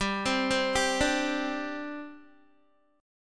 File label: G Suspended 4th, Guitar; Gsus4 arpeggiated; 14,15,12,12,14,x